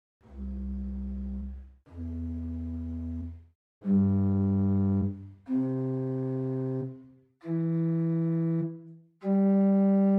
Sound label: Hw Prinzipal8 arp; Grace Lutheran, Champaign